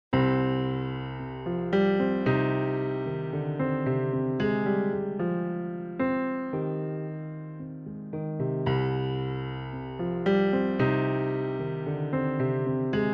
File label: piano; Katarakt records